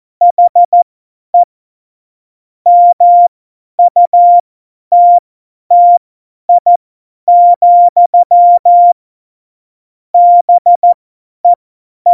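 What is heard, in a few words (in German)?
Witz, Tempo 50, Morsekurs, cw morsen; Witze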